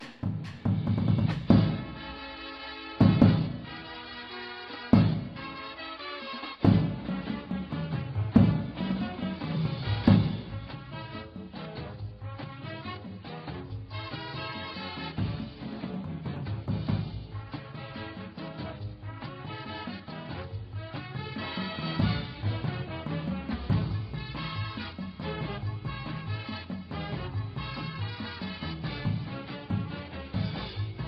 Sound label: bass w music, drumline